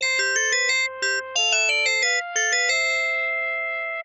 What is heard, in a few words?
phone ringtone; NS Studios - ultra sound collection